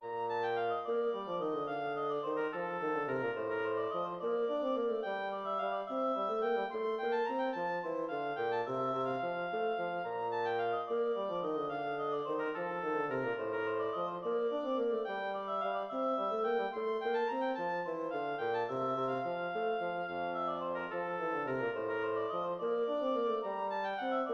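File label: air oboe bassoon; hwv 471; handel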